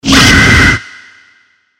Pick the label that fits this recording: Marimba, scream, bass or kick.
scream